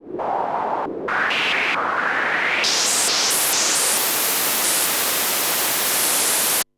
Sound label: Yamaha CS5, Filtered Noise; noise hiss crackle